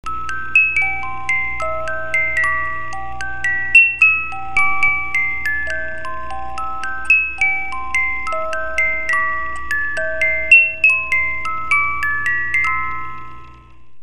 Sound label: Rockaby Baby Music Box; Lullabies